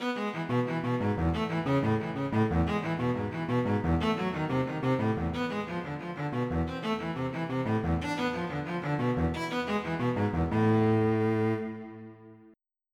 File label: Cello; Roland - An Invitation To The Roland World Of Music